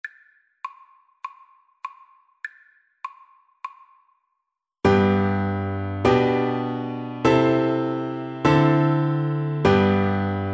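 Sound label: recorder karaoke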